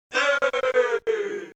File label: scratch